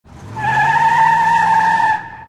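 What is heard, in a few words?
sharp car start tire squeal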